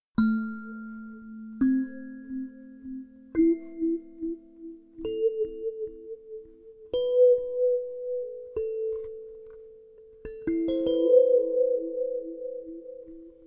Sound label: Sansula Example